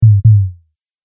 Sub Drop Double, Low Impact Sound Effects

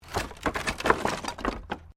Wood Movement Medium

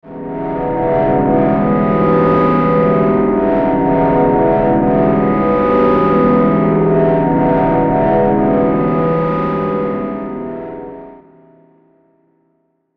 Musical Swell Feedback Flange; Swells; Blastwave

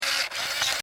Scifi Servo Mechanical Robot Small Motor Toy

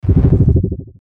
Sweeper Bass Chopper Warble; Production Element Imaging Element Accent Transition

